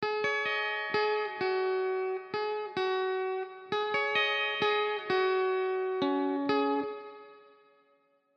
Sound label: strato wet melo; Chiqui Audio; Melody 40 130 Bpm D Min; Fender Stratocaster